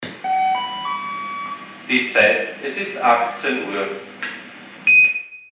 railway station german

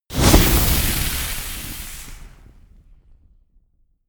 campfire medium extinguish

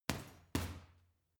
boxing punching bag uppercut double soft

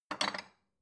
bowl take hard

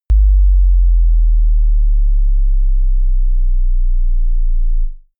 bass drop soft

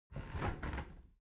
window close soft

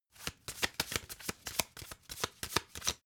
playing cards shuffle regular

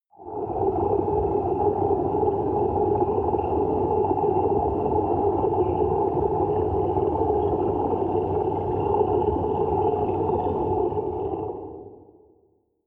water fx dark

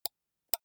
computer mouse left click single slow

